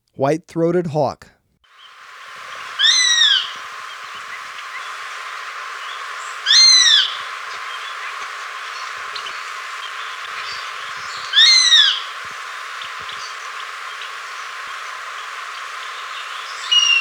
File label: White-Throated Hawk, Birds of Ecuador; S. America